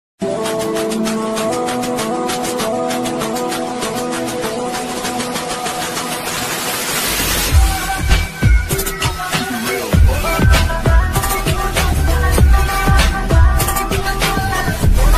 sad ringtone, hindi ringtone, mobile phone ringtone, flute ringtone